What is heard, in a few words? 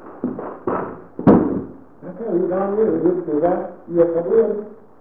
stomp; Olympus Digital VN-1800; an unseen force hits (and brakes) the 35mm camera that was in her hand; Lost Church